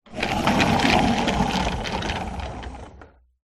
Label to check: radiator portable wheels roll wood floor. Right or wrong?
Right